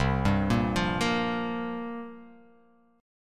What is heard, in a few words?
C 7th Suspended 4th, guitar